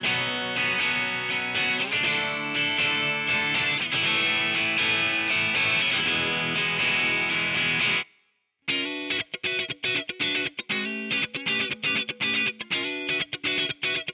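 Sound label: UltraLite mk5 Guitar Amp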